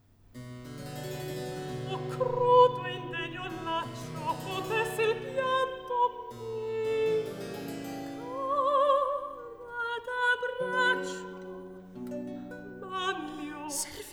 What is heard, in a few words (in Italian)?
Recitativo; (Servilia, Manlio); Oh! Crudo indegno laccio; Antonio Vivaldi (1678 - 1741); Vivaldi - Tito Manlio (2005)